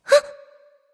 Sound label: surprised; smite; voicepack; jingwei